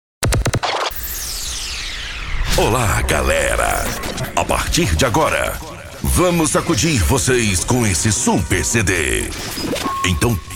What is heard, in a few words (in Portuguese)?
esp funk antigo